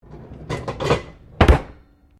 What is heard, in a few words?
Drawer Interior Wooden Kitchen Large Oven trays Close; Various domestic drawers; Household